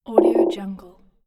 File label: error; fx-library